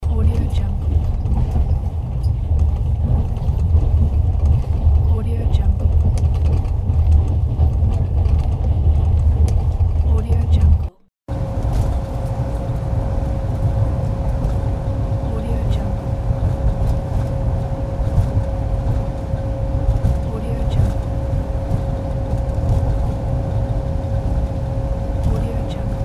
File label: train inside carriage loop